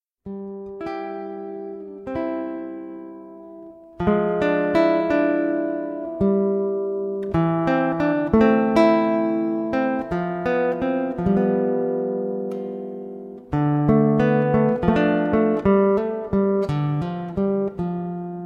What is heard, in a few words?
clair de lune, guitar